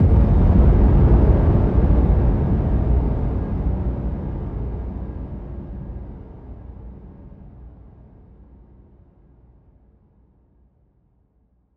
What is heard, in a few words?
explosion